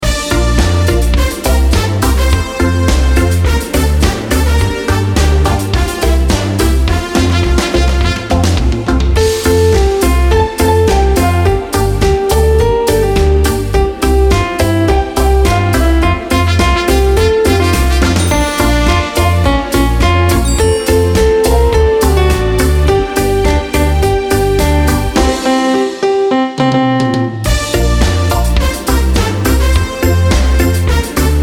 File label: karaoke